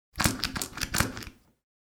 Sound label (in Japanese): ohuro